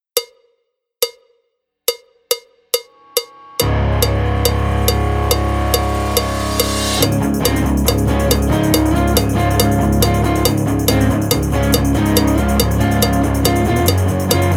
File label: backing track with click